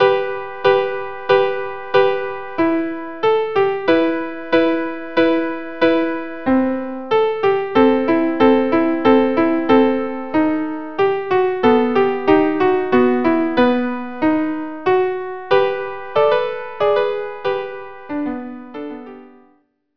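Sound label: violin violin; Hebrew Melody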